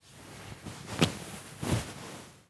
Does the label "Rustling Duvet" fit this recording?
Yes